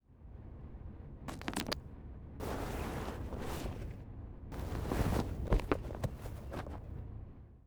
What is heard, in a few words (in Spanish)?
ESNIFA; yesterday origins gamerip 2016 switch ps4 pc ios macos